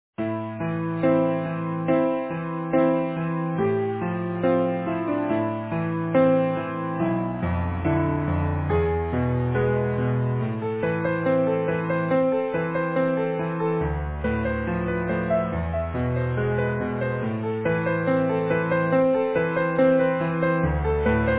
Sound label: Easy Piano